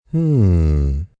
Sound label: "Hmm" Ponder, male; Reaction; Exclamation